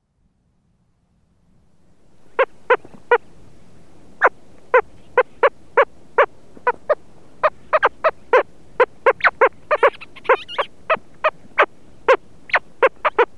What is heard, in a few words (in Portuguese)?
Calca mar Selvagens